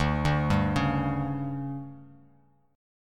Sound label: D Major 7th Suspended 4th, D, down, Bass Standard; DM7sus4; arpeggiated; JGuitar